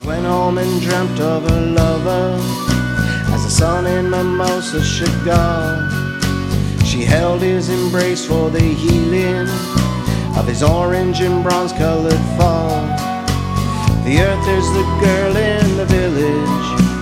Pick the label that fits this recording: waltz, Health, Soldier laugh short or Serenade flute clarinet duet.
waltz